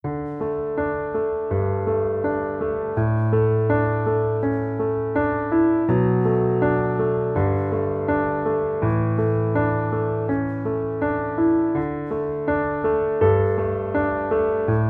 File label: Underscore PIANO; Worship Flow